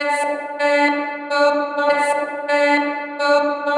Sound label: chopped vocals female - dm - 95